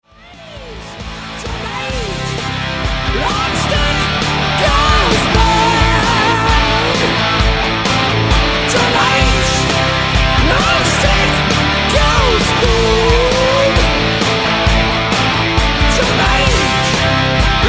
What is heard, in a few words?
long stick rock medley; rolling bones